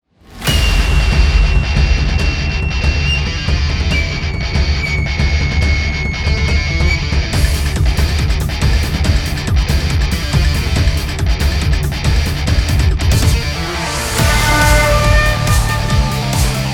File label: Battle Boss; Type Fakevalkyrie